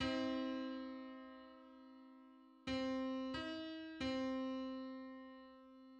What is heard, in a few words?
Six-hundred-twenty-fifth harmonic on C